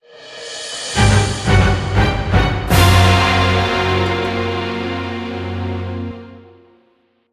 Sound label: Game Over Jingle 122 4-4